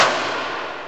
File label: HAMMR HIT; TECHNO-INDUS; Greytsounds VOL-1